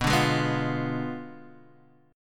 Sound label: D Diminished 7th B